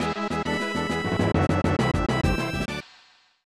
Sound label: Brass of Victory